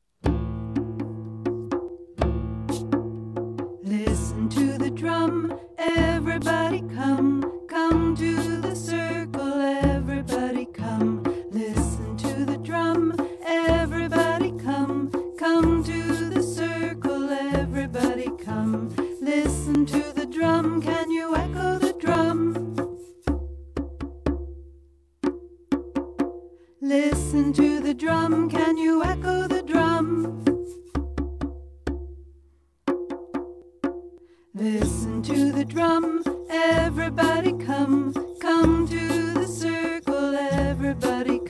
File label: Drum